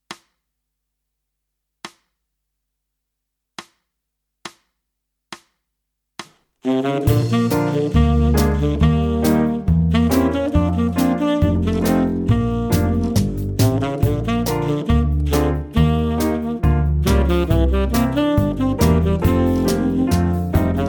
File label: Tenor; Saxophon; Play-a-long; Ragtime-Klassiker